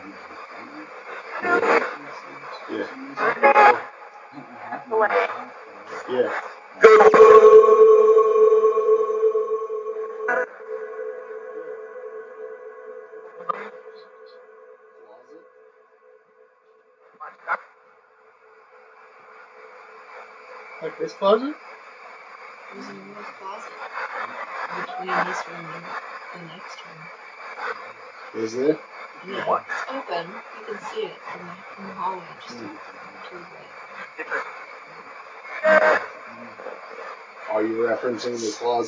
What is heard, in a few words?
Library Spirit Box; wexford general store antique shop return investigation